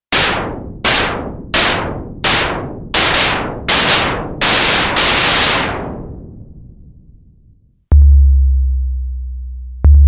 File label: acb percussion